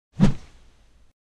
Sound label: Mid Low, whoosh